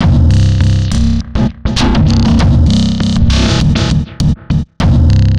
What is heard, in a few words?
drum